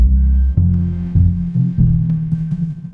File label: notification